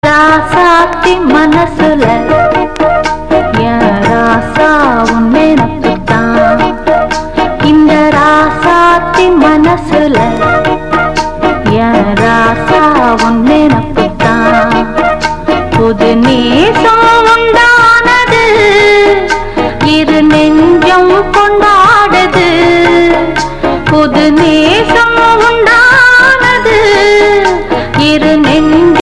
tamil tune

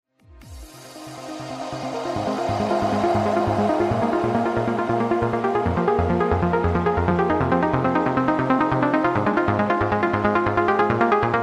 nice electronic tone

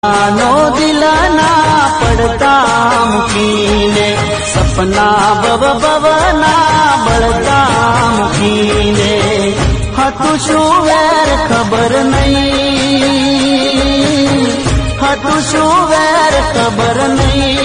Gujarati ringtone

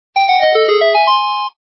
notification; zelda

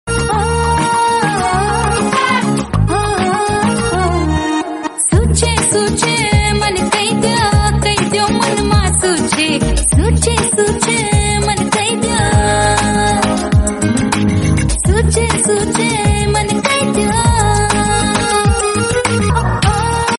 srilankan romantic, ringtone

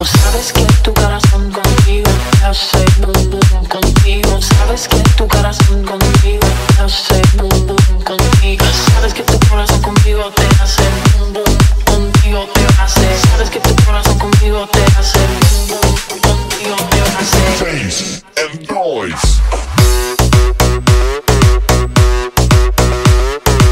turkish romantic

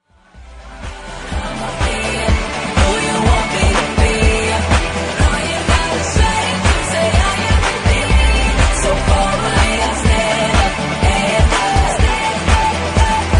hiphop

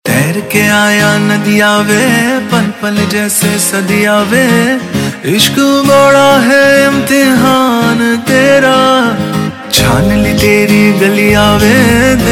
pakistani

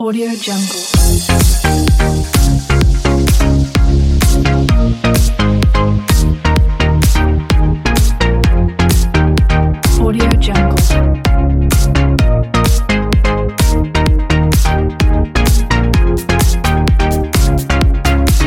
deep house, ringtone

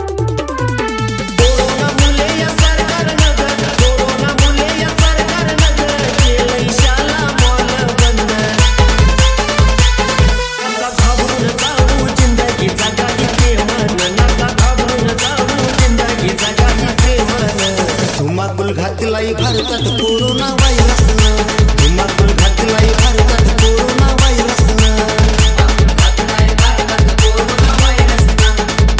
beautiful marathi

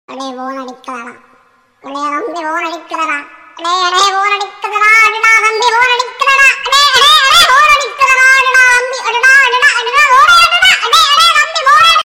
tamil

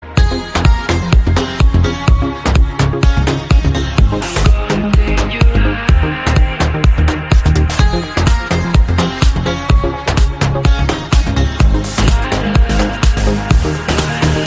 nokia classical

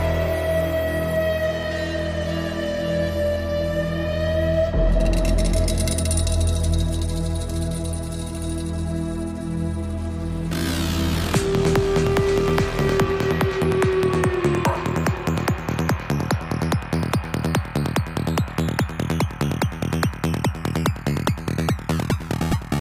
electronic

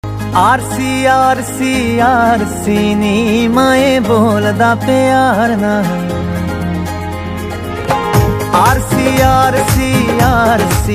punjabi song

